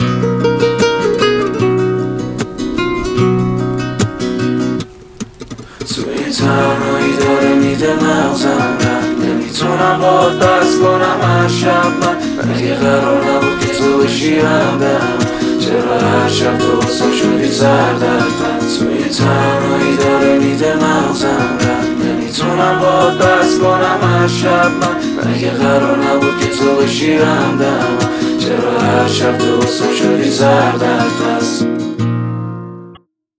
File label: Version Guitar